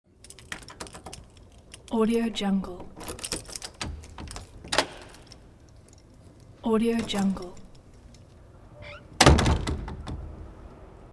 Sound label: door open key